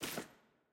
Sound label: sfx ui map panel select; demacia rising; poppy